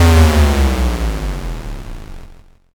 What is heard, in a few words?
explosion